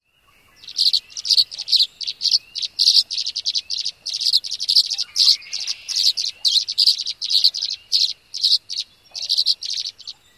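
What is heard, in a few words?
TRESWA calls num 1, tree swallow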